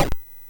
Mini Golf, MOS6581R4

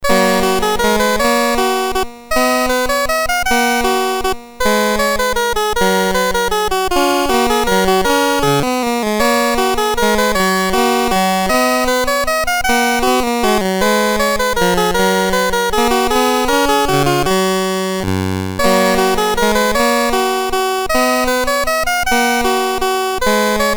Minuet in G BASIC, Commodore MOS6581R4

